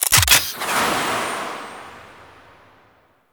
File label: Space Attach, Vehicles; Warframe; Archwing